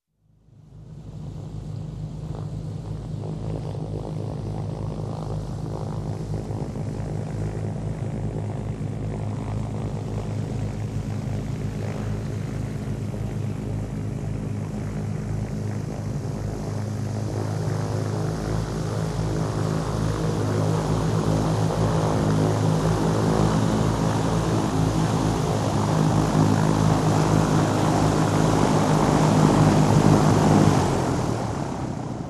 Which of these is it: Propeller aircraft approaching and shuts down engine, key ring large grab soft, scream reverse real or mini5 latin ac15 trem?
Propeller aircraft approaching and shuts down engine